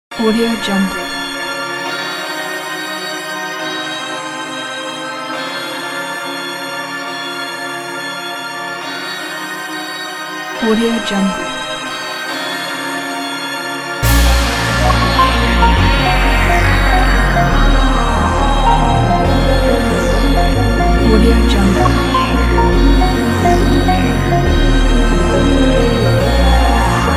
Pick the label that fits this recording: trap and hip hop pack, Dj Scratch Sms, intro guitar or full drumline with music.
trap and hip hop pack